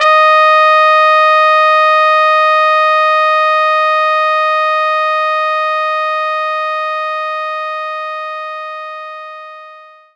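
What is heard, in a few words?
trumpet D#5; knights try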